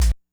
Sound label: kick hat, hardcore breakbeat; 129 Cmin; Big Fish Audio; Hardcore Breakbeat Action